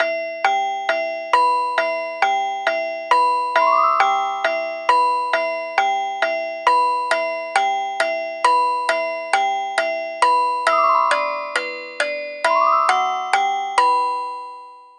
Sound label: clock work